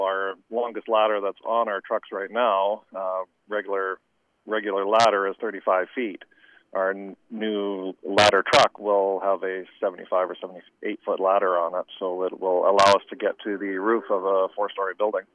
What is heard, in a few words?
New Fire Truck; Borg